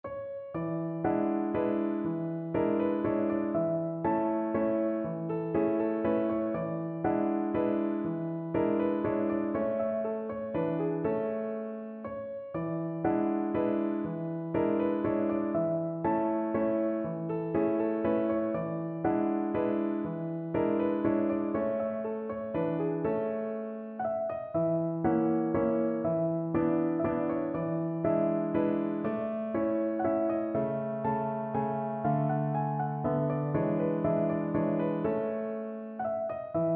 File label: german dance, piano